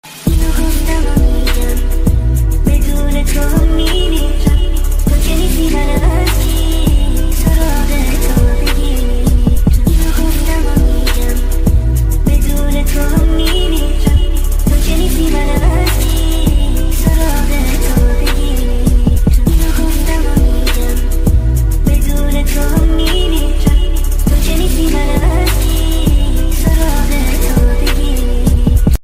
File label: Arabic Music Islamic Ringtones